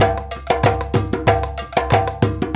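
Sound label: 93 bpm, Guinea; Spectrasonics; Supreme Beats; African Contemporary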